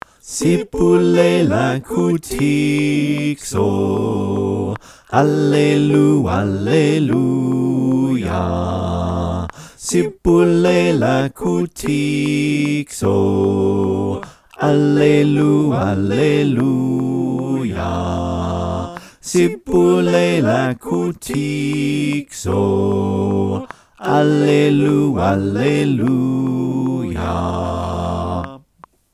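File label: bass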